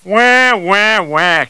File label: wah; chatsounds; homer